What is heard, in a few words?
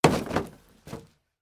crash; snakeinabox; double time pitch shift